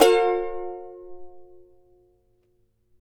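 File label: charango G major down; Spectrasonics; Hans Zimmer Guitars VOL-2; CHARANGO CHD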